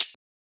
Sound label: Closed Hat; FLPRO